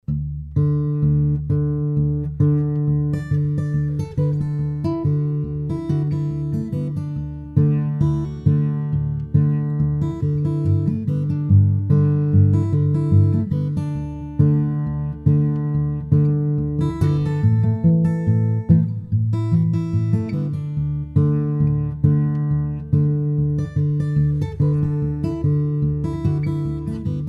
Blues, blues roots fingerstyle tunes

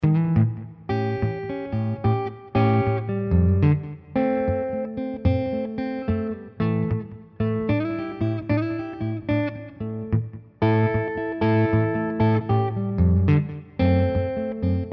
key of A, dead thumb fingerstyle

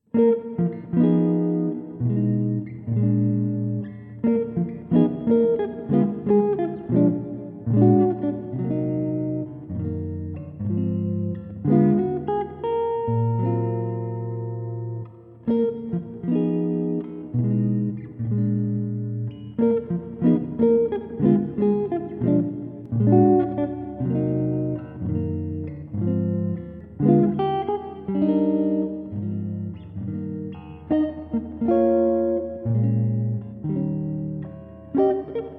Chord Melody; jazz tunes

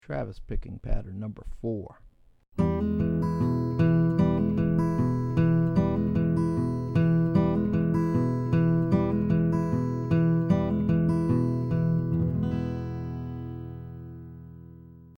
Travis Patterns; travis style lesson materials

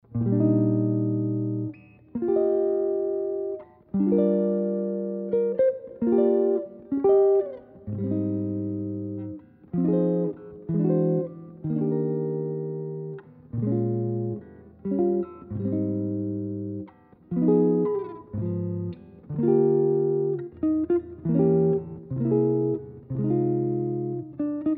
Chord Melody, jazz tunes

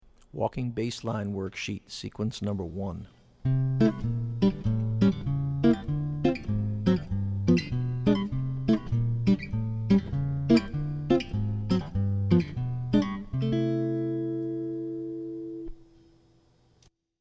Walking Bass Lines; jazz chording

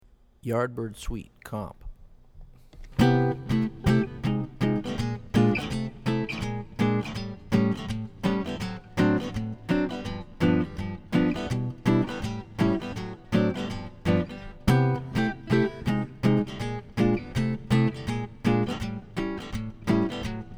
Comp, jazz tunes

